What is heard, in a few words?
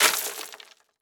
Crash Glass Pouring On Wood Short; Crashes